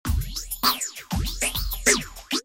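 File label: drums, Vermona, phaser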